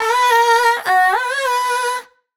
riff Oh Woah Ya 110 B wet, Vocal Sample Pack, Raw Vocals, Wet Vocals; Slate Digital Omnivox Vocal Sample Pack